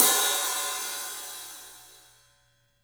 Studio Hats, 3DPercussives

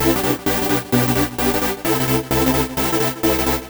Kaossilator gated (130BPM); musicradar

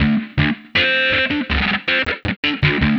Funk Junk, Leads And Riffs; Sonic Foundry (Sony Creative Software); Synchro Funk

